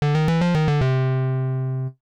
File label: Miss; The Lost Castle in Darkmist; arcade